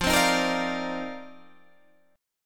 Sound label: F 13th Suspended 4th F, chord